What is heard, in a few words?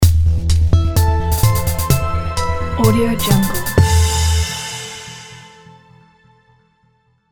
health ident; gokhantamir